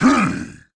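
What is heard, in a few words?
Elemental Knight hit; Urke; MUDevs S20.1-3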